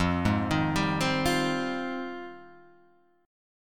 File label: F Minor 9th F